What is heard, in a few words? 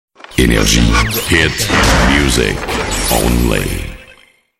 jingle; nrj